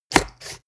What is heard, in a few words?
scissors cut paperboard